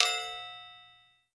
Marble Stn Hit

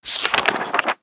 Book Page Turn

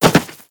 Dirt Jump

